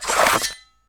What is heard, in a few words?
draw greataxe; Mount&Blade Warband